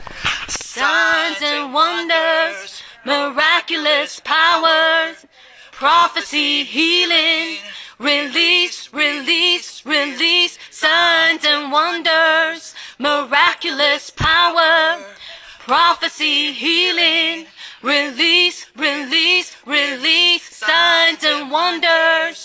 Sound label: signs alto